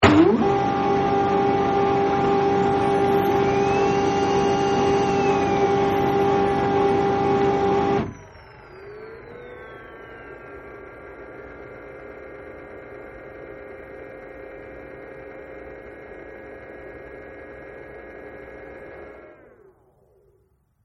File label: Printer Startup, Printer Turned On Startup Sequence, Servos Moving And Humming; Printers (Sound Effects:Electronics)